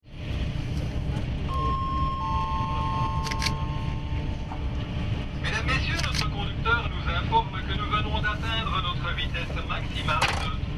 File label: thalys